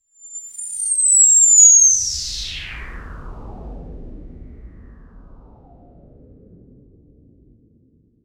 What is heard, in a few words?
minimal air movement tran; monochrome